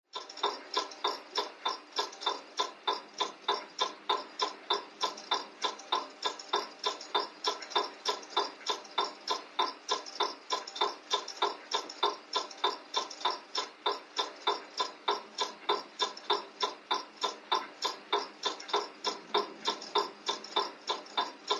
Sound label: CLOCKS MANTLE CLOCK: INT: Old metal mantle clock ticking, across room, room tone audible